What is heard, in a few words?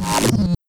Wzzzap, techno drum fx; Single Hits